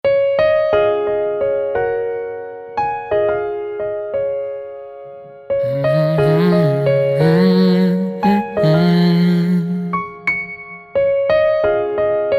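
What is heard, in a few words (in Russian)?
звуки пианино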